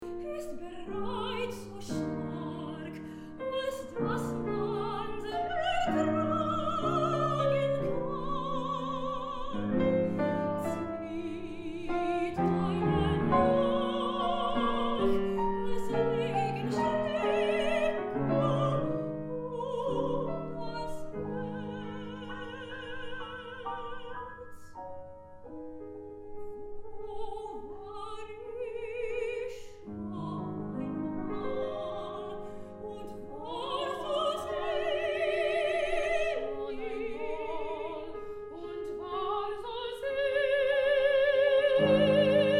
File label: Strauss singing sample